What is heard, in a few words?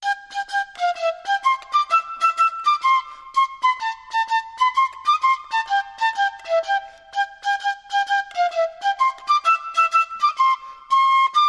Solo Fife; Battle Hymn Of The Republic